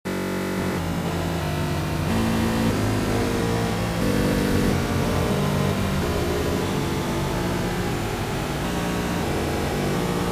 Data Malfunction alternating granular frequency shifts